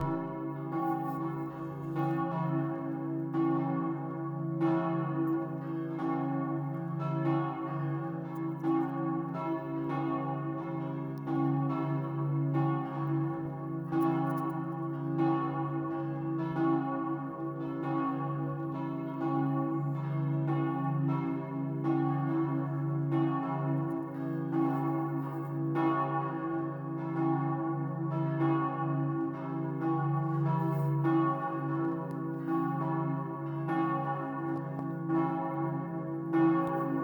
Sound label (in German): dom havelberg glockenlaeuten